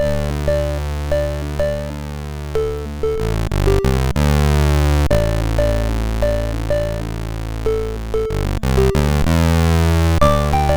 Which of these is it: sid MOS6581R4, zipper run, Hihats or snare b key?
sid MOS6581R4